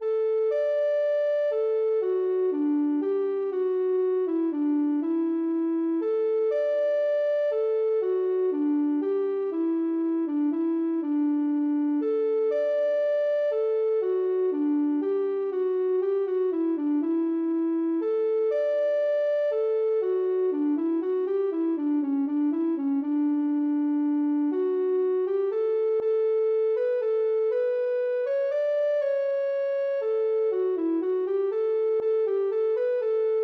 ocarina

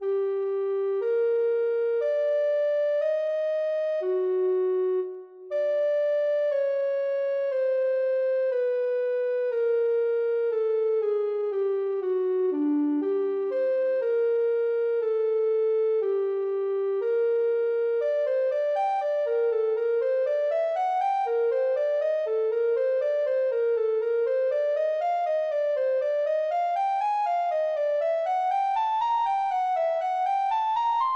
bwv1079 cancer, ocarina

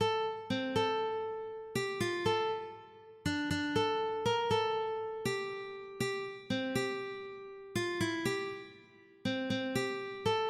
ukulele

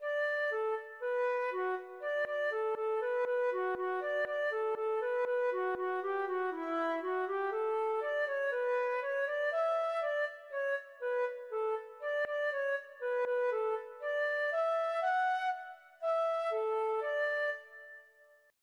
jore, feadóg

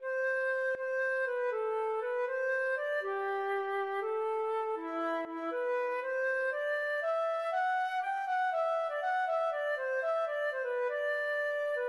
bubble, feadóg

